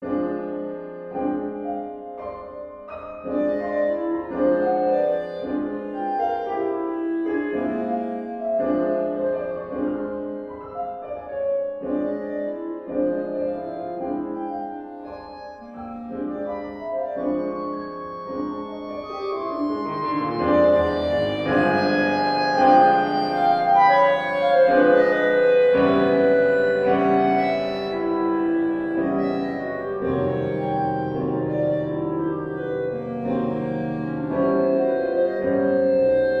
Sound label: Patterns for Clarinet and Piano Blue Largo